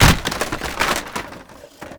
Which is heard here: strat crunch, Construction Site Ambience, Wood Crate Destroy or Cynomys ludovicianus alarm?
Wood Crate Destroy